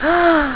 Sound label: lose